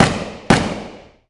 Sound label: Boxing Slap Stick 10 Seconds